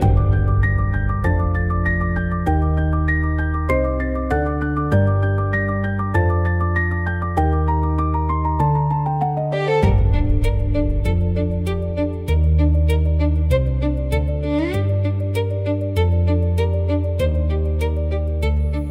piano ringtone

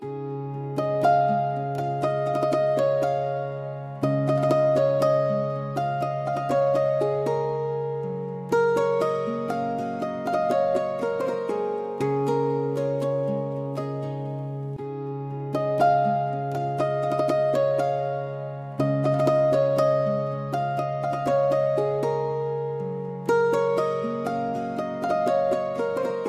tarang ringtone, indian melody

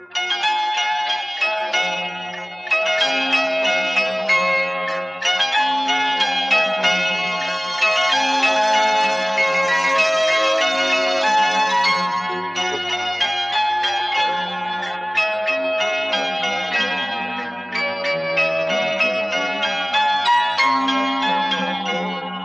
indian melody ringtone

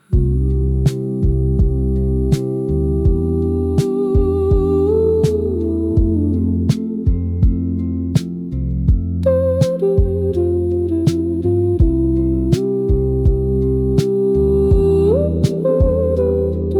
happy vibes ringtone; Kishore Andaaz; (किशोर अंदाज़)

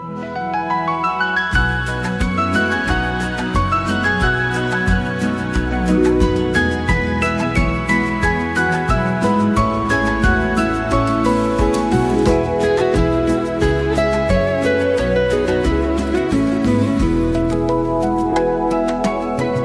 ringtone

